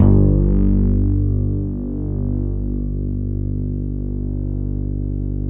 MIDIFRET G 1, Bass, MIDIFRETLESS; Masterbits CLIMAX 7

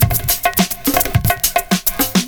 PERCS, 70 s Breakbeats

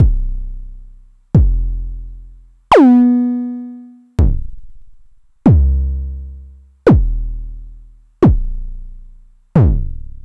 909 mod BD - BASS DRUM

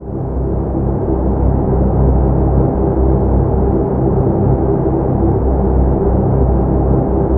ATM TONAL; Best Service - XXL Production Effects

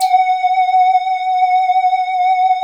LEADS; Masterbits; Vintage Voltage

